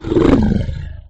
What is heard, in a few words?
mount; sfgame; mounts